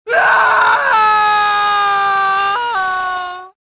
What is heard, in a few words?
falling, prisoner